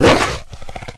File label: pdog make hit; mutants; STALKER; customnpcs